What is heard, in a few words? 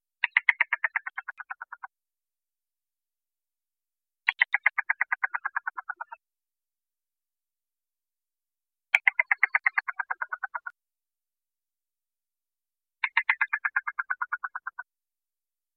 Western Yellowbill Cuckoo; ASU; Ask A Biologist